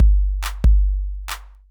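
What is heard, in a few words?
DRUMLOOP ELECTRO 140; Techno2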